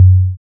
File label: dancemusic